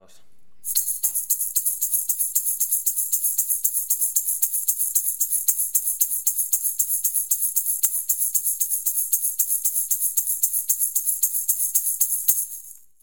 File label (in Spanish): shaker reverb, sonaja